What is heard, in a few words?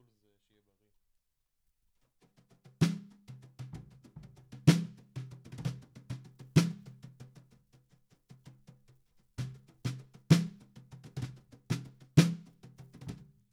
Snare Top